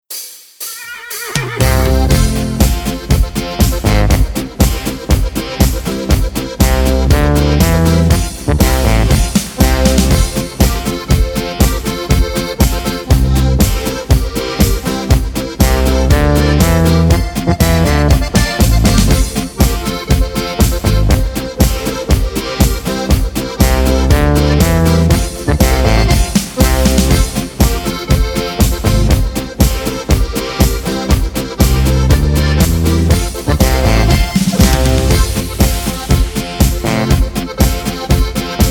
GCFB ohne Lead ohne CHOR

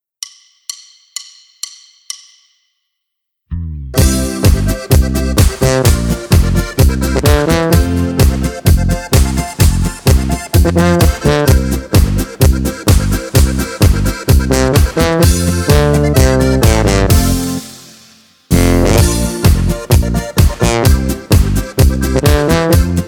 GCFB ohne Chor ohne Harmonika LEAD

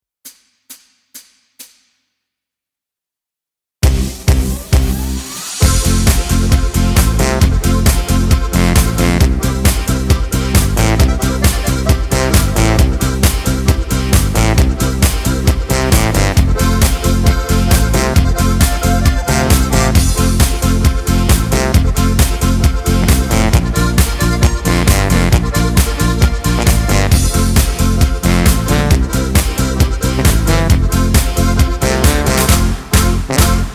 F Dur ohne Chor

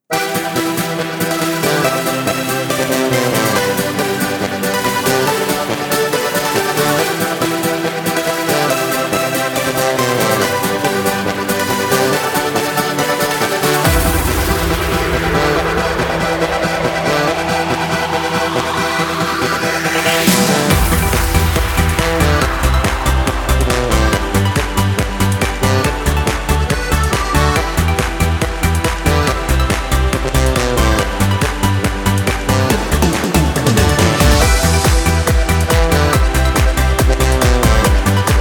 Apres Ski G Dur Karaoke ohne Chor

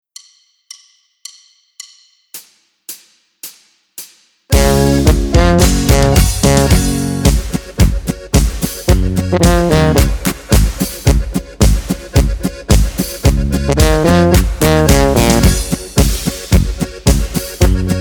Gis Cis Fis H mit Begleitung

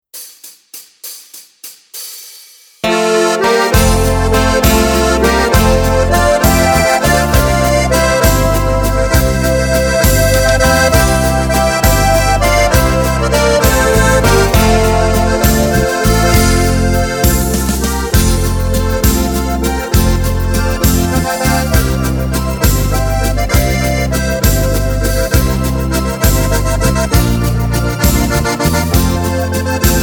Karaoke ohne Chor

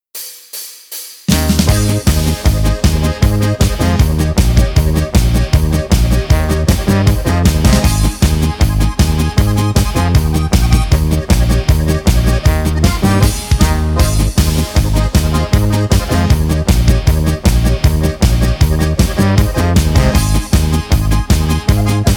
Polka B As Des

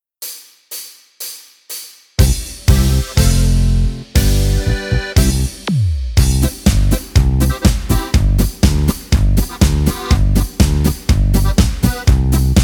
Regimentsmarsch G C F B; Deutschmeister

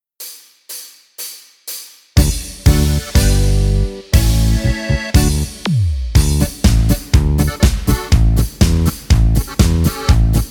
Regimentsmarsch A D G C; Deutschmeister

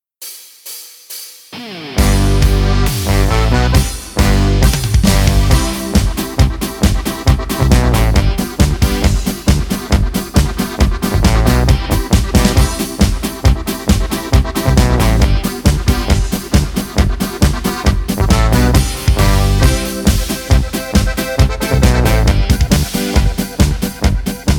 G C F B

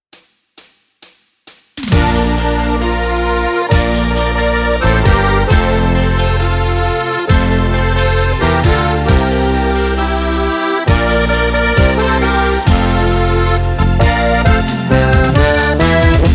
G C F B Karaoke ohne Chor Hörprobe

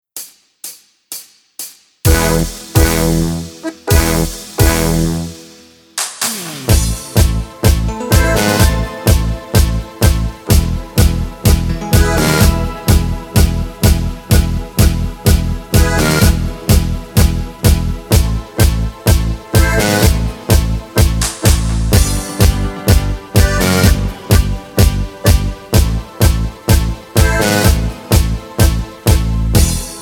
ohne Chor

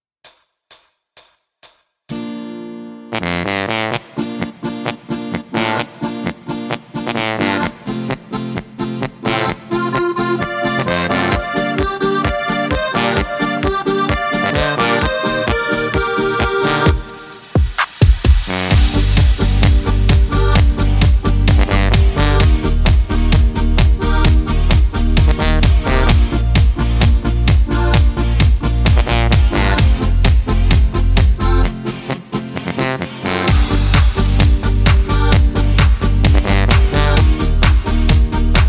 B Dur Karaoke ohne Chor

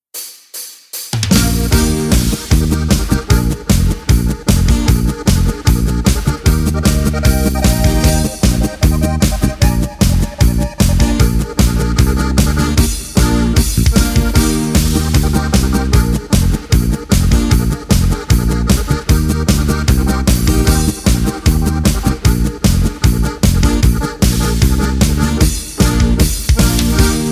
Polka mit Harmonika Begleitung

